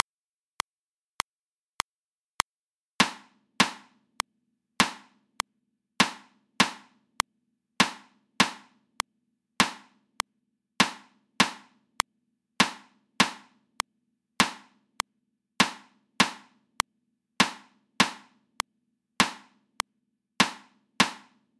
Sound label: reversed rhythms at 100 bpm